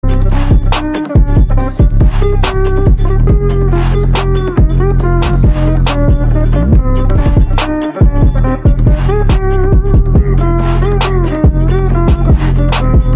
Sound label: trap remix